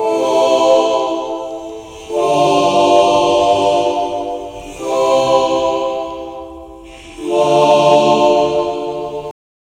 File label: choir